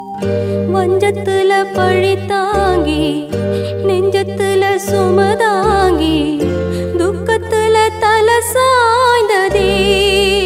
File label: tamil ringtone